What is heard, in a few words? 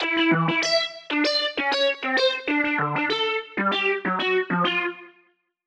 Synth Line